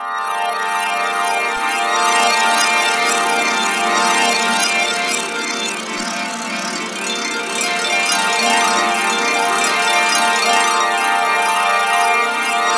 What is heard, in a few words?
INVERT GLIS; Spectrasonic Distorted Reality 1